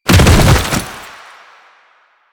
Bodyfall Default; Warframe; Lasrian; Lasrian Soldiers; Foley; Body Falls

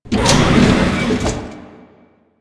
Elevator Start; Venus Kahl; Warframe; Quests; Veilbreaker

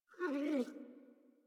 Puppy Idle Coo; Enemies; Vocals; Kubrow; Warframe

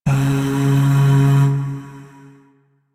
Shawzin Oct One C Sharp; Warframe; Zariman

